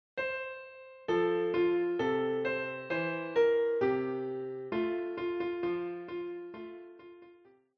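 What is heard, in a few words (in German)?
Auf einem Baum ein Kuckuck sass, Klavier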